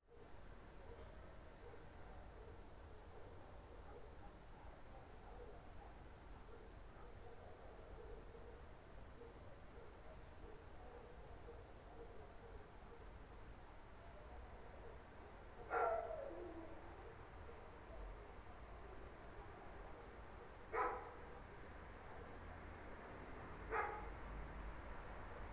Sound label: Ambience Room